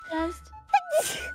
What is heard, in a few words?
Sneeze